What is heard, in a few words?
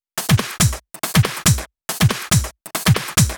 Loops 140 BPM Hihat Percussion Loops